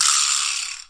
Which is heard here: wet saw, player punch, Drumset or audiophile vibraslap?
audiophile vibraslap